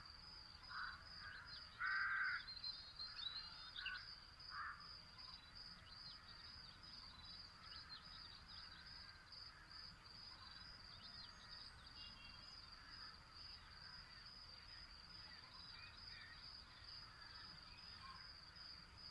background morning, ambient; STALKER; IWP

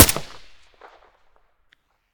ak74u silencer mono; STALKER; IWP

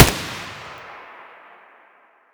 aug muzzle mono distant, weapons; STALKER; IWP

